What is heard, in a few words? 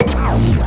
monitor off